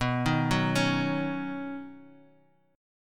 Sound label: B Major Add 9th B, chord; Badd9; arpeggiated; 19,18,16,18